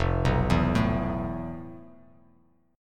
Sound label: F Major 7th Flat 5th, Bass Standard; arpeggiated; JGuitar